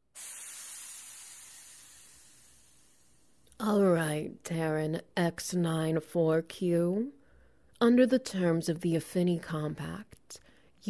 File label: SFX Wet Noises